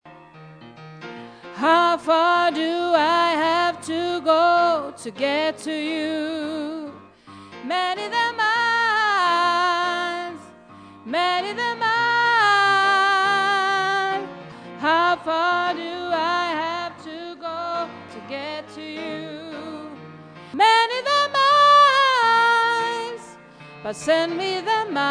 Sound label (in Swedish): sopran, stammor